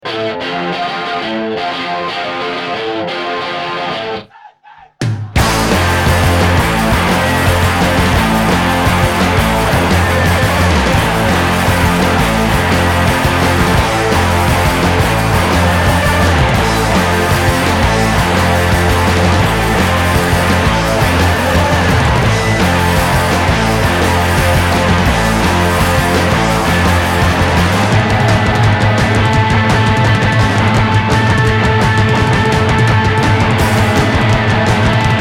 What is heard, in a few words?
action beat